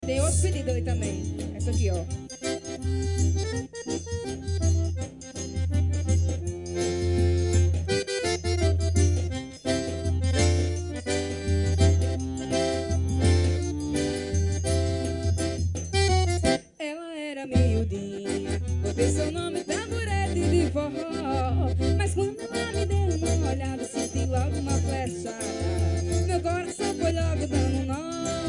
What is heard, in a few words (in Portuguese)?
tamborete de forro